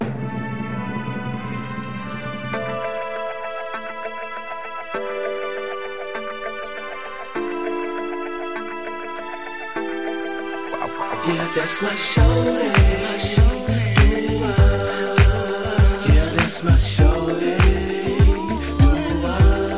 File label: doo wop